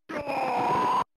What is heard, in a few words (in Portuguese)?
grito; final fight 3